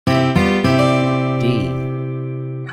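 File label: D4 Acoustic Steel Guitar Major Note Name; ear training instrumental color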